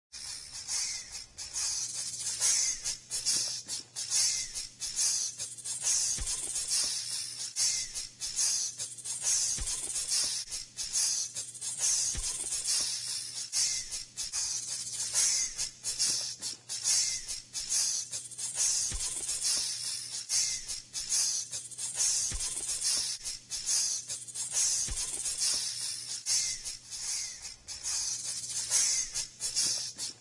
snake jazz

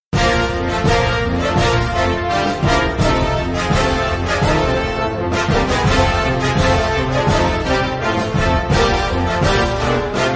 fight song; ohio state

